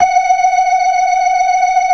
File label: FAST VIB, ORGAN, TREMOLO VIB